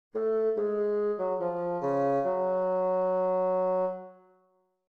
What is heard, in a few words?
fugue